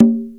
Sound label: CONGA LO; drum; PERCUSSION